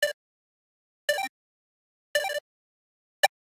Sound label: Arcade Click Neutral; boom library; The Interface